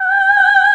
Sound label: SOPRANO G4